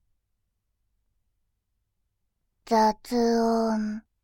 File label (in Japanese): 雑音, バイノーラル 左, ASMR